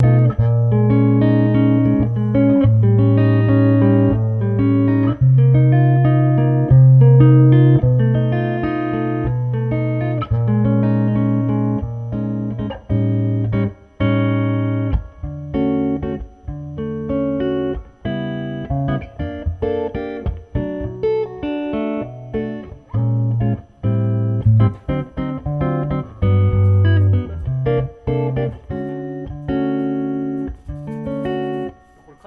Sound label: room; taichi